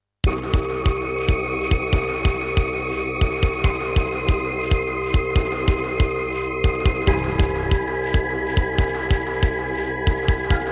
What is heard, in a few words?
Roland SC-88 55MAP; sigil doom episode 5